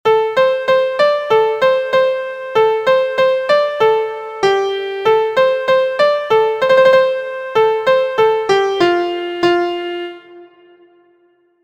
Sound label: MIDI